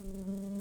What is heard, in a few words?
Humilis workers, bumblebees